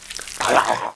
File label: zombie gun draw; zmall